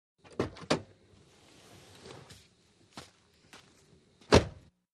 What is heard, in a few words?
Montero Exit Vehicle, 1998 mitsubishi montero ext.